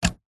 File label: household mains plug australia pull out remove wall socket; zapsplat